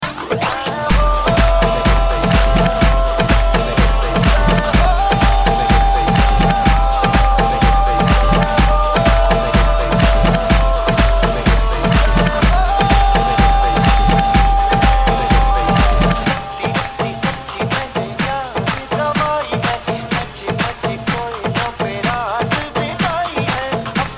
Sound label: Dj Mix